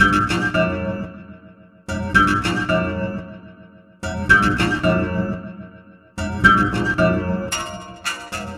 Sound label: Echoic Strings